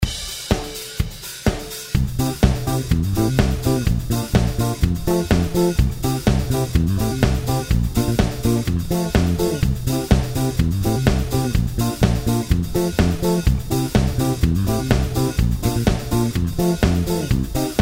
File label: Disco 125